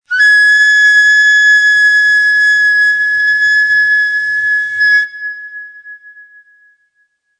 Tiz Evc, Ney

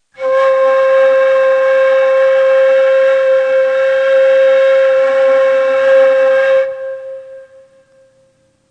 Kurdi, Ney

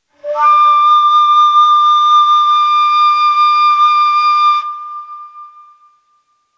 Tiz Chargah, Ney

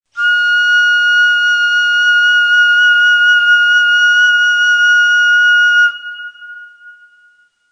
Tiz Hisar, Neysample

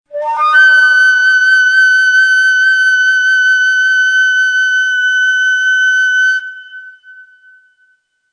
Tiz Huseyni, Neysample

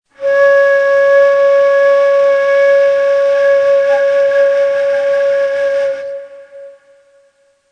Segah, Ney

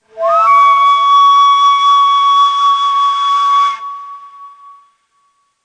Tiz Segah, Neysample

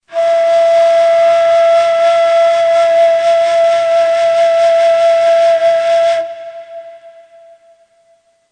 Neva, Ney

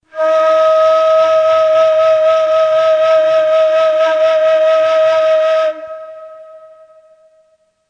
Saba, Ney

